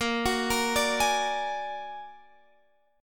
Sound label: A sharp Augmented 7th A sharp Guitar Standard; A#+7 arpeggiated